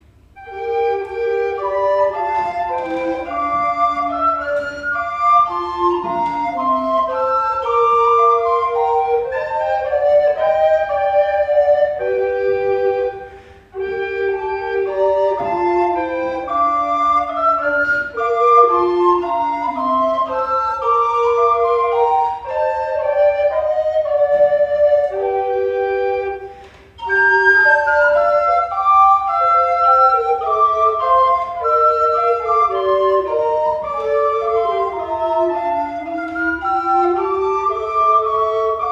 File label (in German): Musikstück; Gottesdienste zum Nachhören; Osterfestgottesdienst